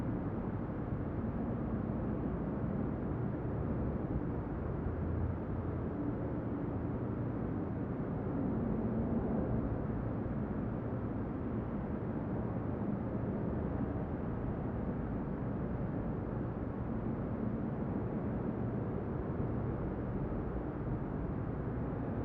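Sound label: Ambience Interior; Pilot Down Behind Enemy Lines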